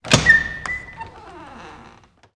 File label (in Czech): dvere; Mafie 1